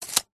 industrial tape measure retract short fast hard close up